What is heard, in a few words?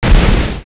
ATTACK